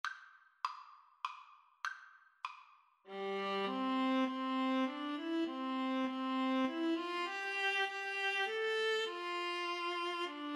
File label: away in a manger, Viola Cello Duet